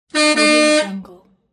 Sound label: truck horn; nerso-77